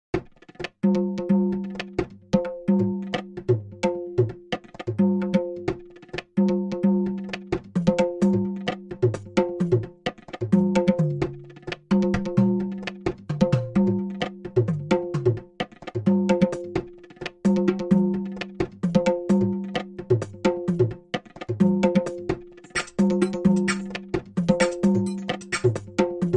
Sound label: bongos and bells